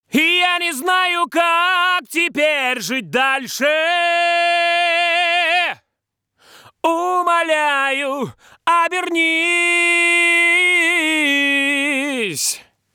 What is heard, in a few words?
vocal